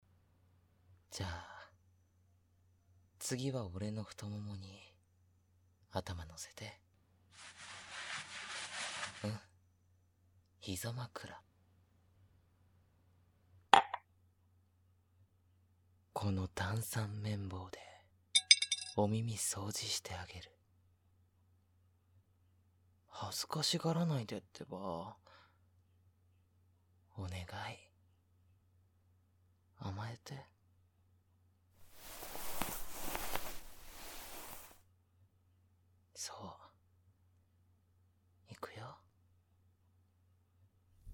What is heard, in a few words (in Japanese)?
炭酸耳かき・右